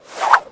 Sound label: cane throw; bonny betty